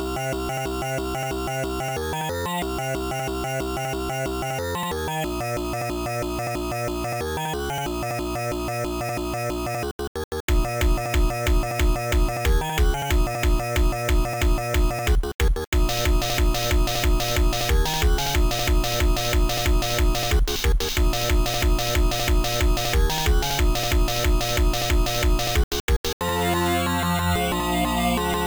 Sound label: VOICE